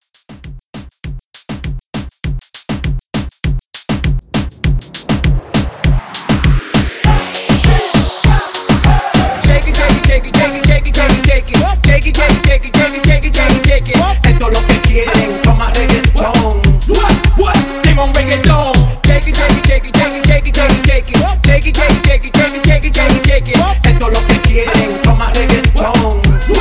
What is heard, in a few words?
Latin Reggaeton Hype 100